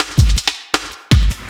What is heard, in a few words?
LOOP SD, 160 BPM, Drum & Bass; Ueberschall; Drum & Bass Flash Trackz